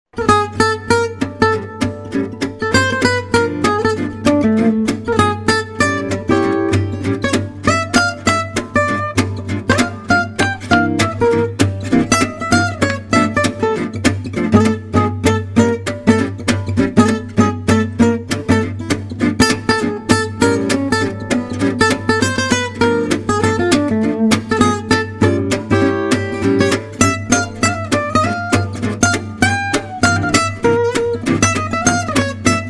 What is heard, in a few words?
Manouche; Fast Mel - Dm; MyVirtualBand